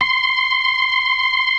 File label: DRAWBAR VIB, Synth and Organ; InVision Interactive; Keith Emerson; 4DRAWBAR+VIB